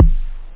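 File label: 808 hard kick; OctaMED SoundStudio V1; jazzies samples; perc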